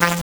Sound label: dirty